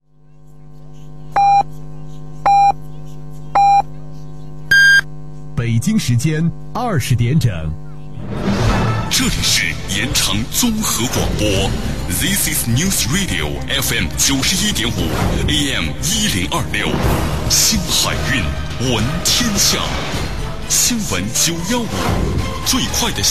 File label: 915MHz; yancheng